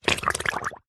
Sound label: foley balloon pump in shallow water release air bubbles; zapsplat